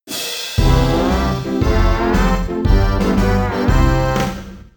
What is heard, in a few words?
Fight Over; Clayribbean Cruise; N64